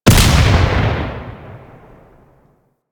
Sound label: Cannon Shot; Grineer